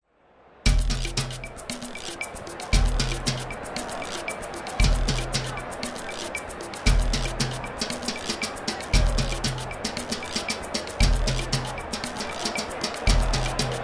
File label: Samba